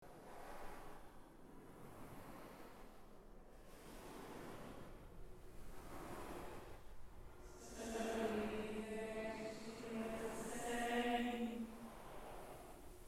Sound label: hahah